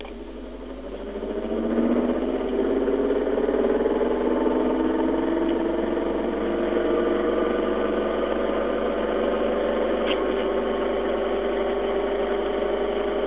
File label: trans squeak, jeep grand cherokee